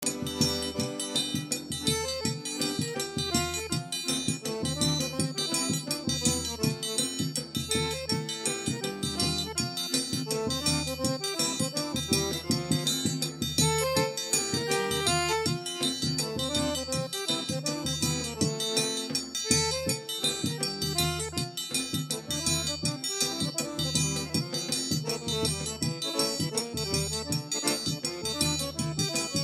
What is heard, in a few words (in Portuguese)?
xote miudinho